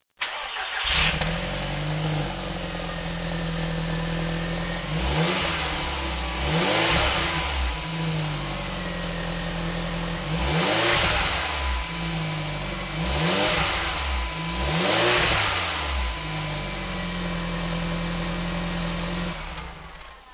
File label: Blue Flame Exhaust